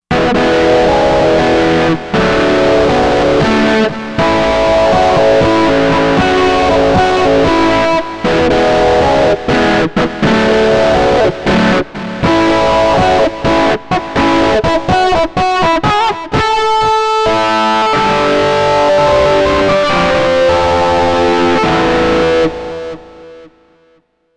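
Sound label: Guitar British Stack, synth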